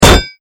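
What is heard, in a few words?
impact armor medium; imp audio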